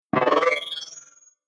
Sound Design FX Scifi Robot Shimmer Hit Interface Space